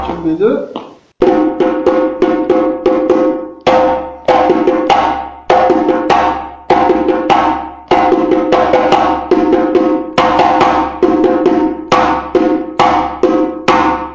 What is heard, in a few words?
Djembe; Sorsonet